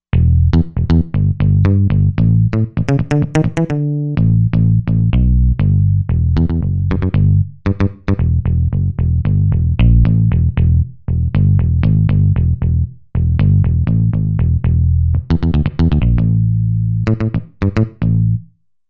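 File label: Bass Pickit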